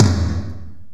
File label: GATE TOM; Crypton - DrumBase5000; GATE KITS